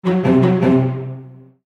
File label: warning